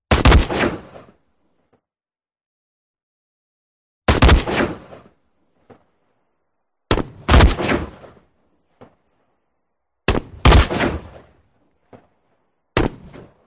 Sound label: guns shooting rifle shots designed; audio mangler